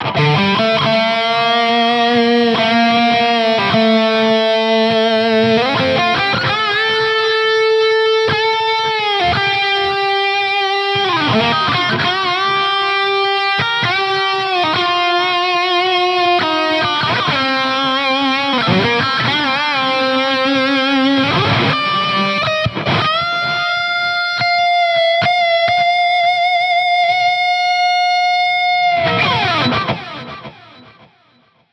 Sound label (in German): Floorboards im Vergleich Lead Fender Tone Master Pro